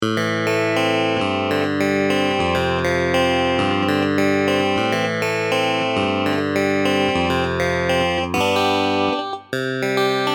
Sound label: Silex Synth